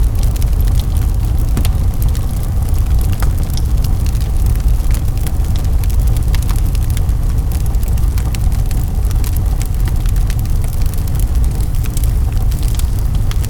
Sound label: Big Fire Loop